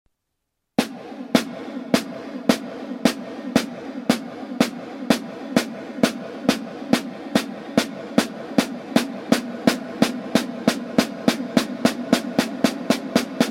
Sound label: double stroke roll